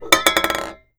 roll can

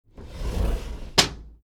glass door

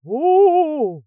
wowowow

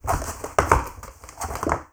takeoff

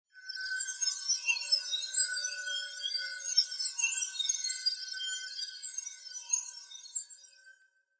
bell world

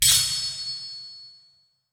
shaki echo

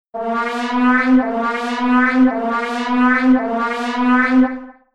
emergency

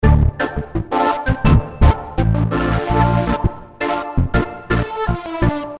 dist plus

